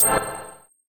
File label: Coin up